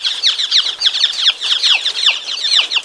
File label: chick; valve; ambient; animals